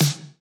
snare; Electro; futurefunk

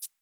shaker; Electro; alternative

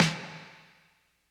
80s reverb snare

